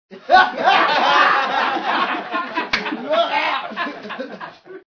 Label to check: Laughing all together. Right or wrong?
Right